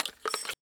gp30 insert grenade; ins2; weapons

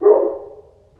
distant, dog

